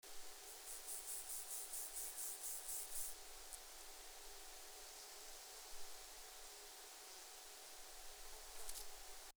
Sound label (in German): Omocestus petraeus Gesang Balz; Nordburgenland